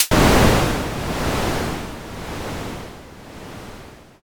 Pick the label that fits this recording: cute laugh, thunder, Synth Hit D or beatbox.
thunder